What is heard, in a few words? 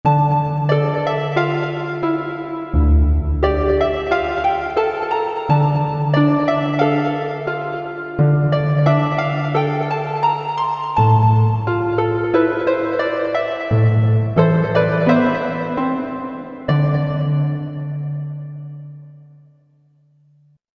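Stereo Harp, Korg MSC-1S; Stero Harp; Korg MSC-1S Variety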